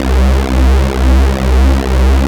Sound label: JUNO DIRTY; Q Up Arts; Dream Experience; DANCE